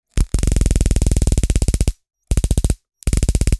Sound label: Bug zapper rapid electrical zaps; insect